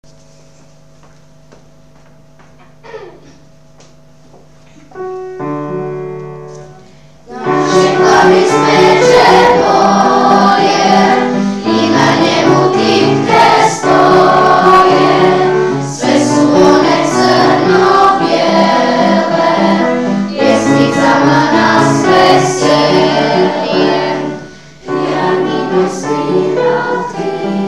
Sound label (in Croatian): klavir